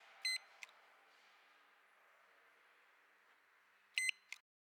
Elevator Button

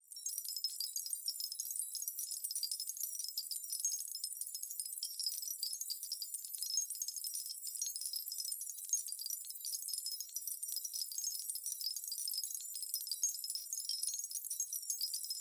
Shards Of Glass Collide In Space Dry

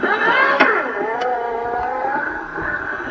Electric Crank Wind And Reverse Single

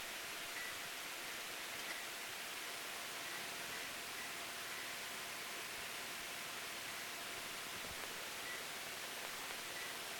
Beeping

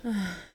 Sough Female

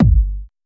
Techno Kick

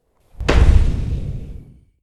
Explosion

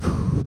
Breath

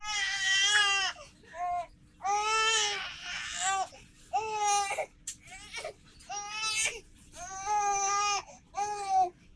Baby Crying

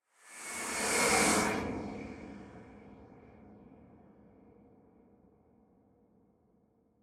Horror Creepy Resonance Swoosh

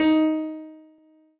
Piano Ff

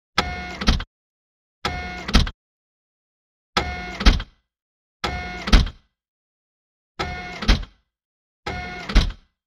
Tripod Leg Step; Wotw 2010

